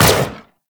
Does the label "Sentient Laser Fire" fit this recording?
Yes